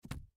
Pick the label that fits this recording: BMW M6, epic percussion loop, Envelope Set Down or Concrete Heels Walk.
Envelope Set Down